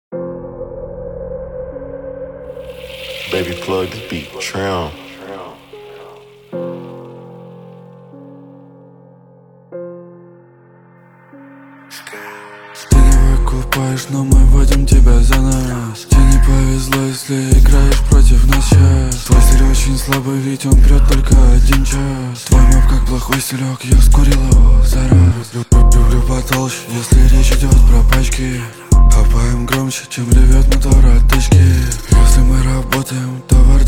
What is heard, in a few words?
glocki lowtab style